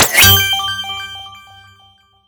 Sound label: Star Reward; Skylanders Cloud Patrol; Arcade, iOS, Mobile